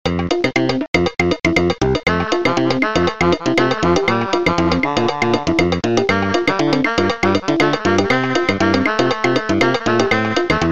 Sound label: Steel Drum Rhumba